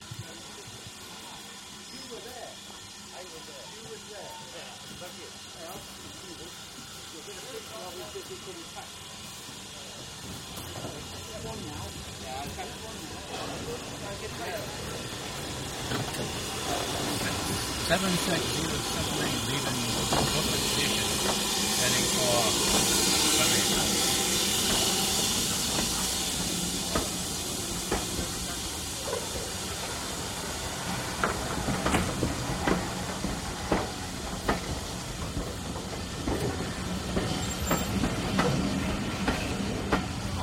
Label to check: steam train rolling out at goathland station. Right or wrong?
Right